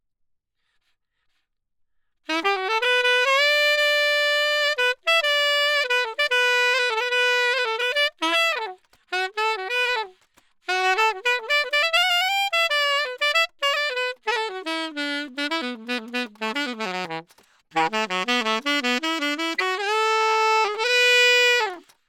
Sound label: Sax; Gefell